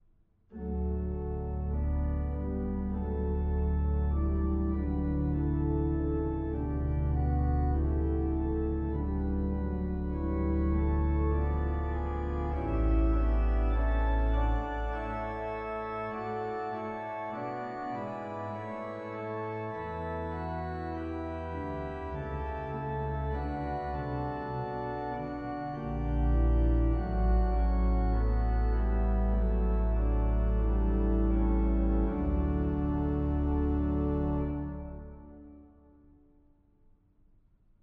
Dejlig er jorden forspil D dur

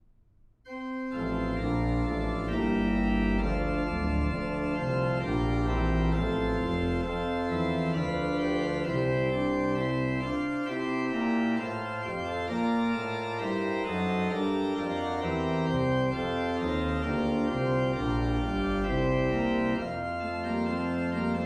orgelkoral; du som gaar ud

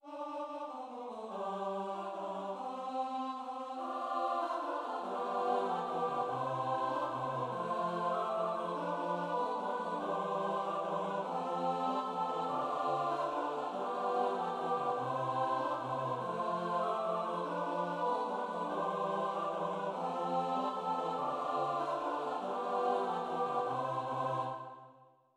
valse kanon

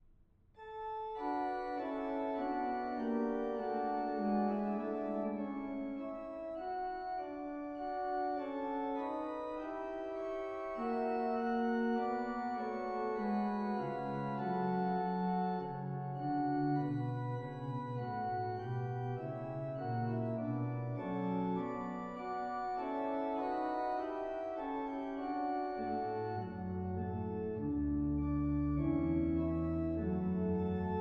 forspil; Barn Jesus i en krybbe lå

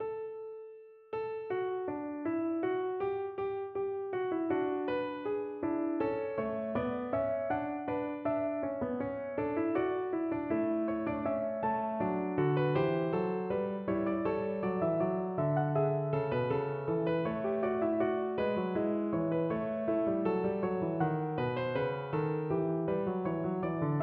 fuga i d-dur

